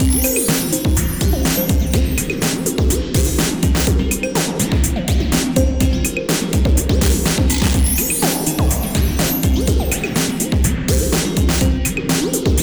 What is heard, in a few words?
fm ditty